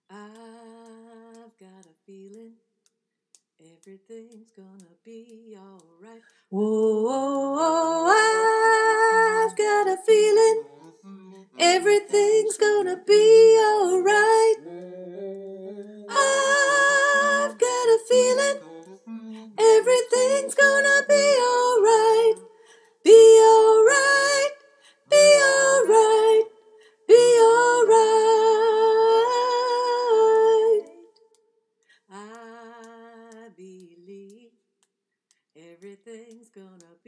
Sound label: Soprano, choir